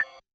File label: Realistic Concertmate-670 Rhythm Perc Ride Tail; Rhythm Layered Kit